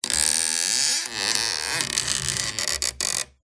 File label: Stress Creak Squeak Groan; PMSFX